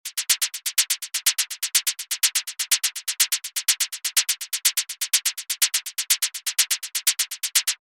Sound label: Hat, gentle hats hi hats and shakers; basic wavez; gentle hats vol 2